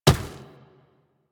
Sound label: Infested Medium Run Dirt, Footsteps; Warframe